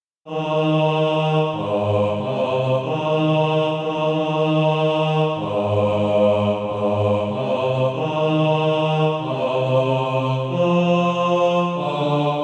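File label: bass, SATB Practise Music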